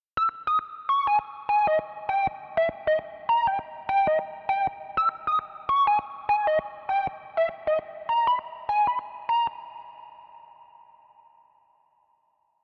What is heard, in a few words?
Melody Pluck Lo Fi; midi wizard 2; Unison